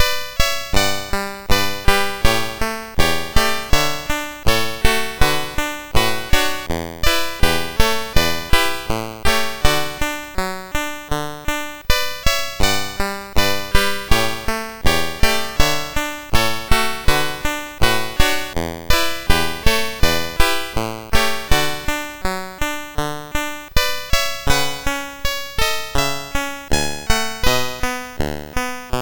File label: SX 64 Demo sid MOS6581R2; Commodore